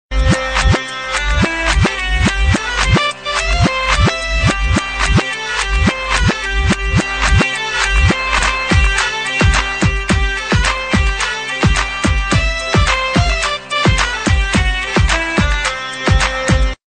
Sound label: Bagpipes